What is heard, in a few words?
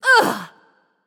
ugh; smite; themorrigan